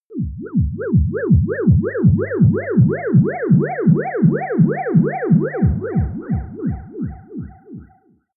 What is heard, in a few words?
ululation ufo